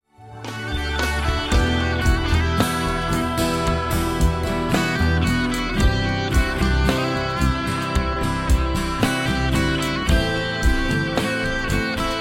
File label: Morning Down